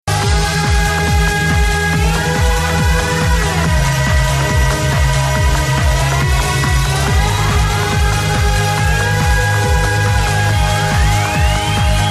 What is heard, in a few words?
rock siren, Siren tune